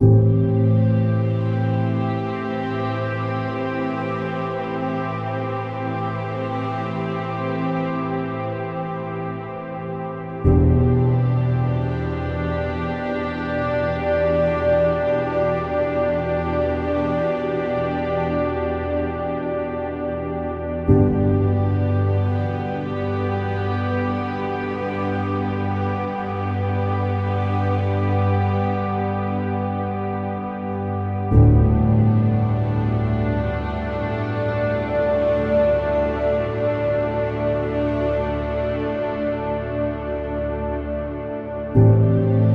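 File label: Meditate, Meditation background music with soft ambient pads and peaceful sound textures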